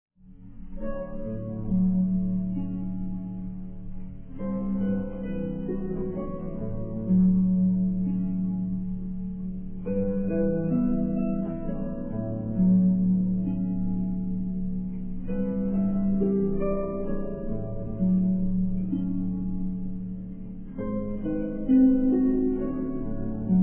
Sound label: Children's Games; Curious Case of Benjamin Button